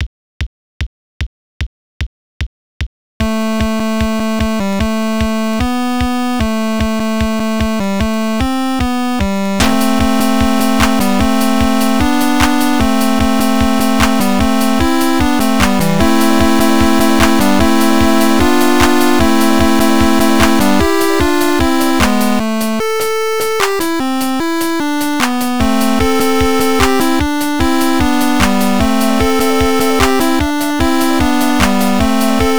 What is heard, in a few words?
song